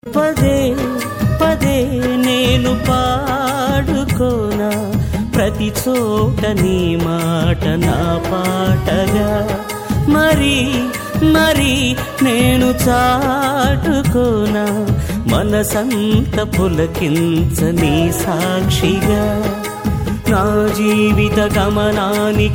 Telugu Christian

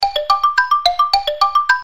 Island monkey

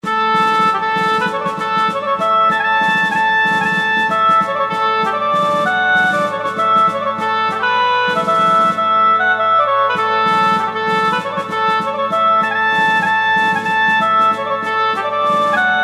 Folk

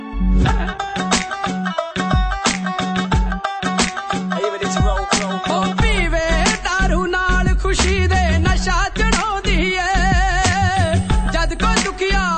Punjabi

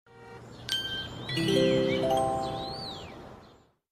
Korean Intro

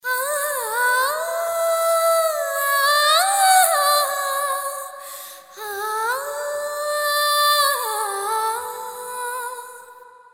Humming Girl Nice

